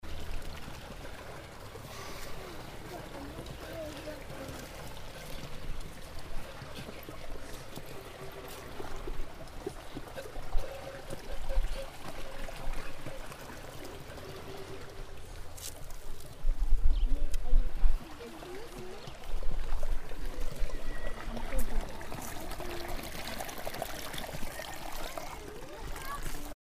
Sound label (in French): Lavoir Eau bambou nature